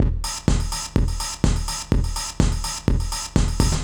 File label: DEEPHOUSE BEATS 125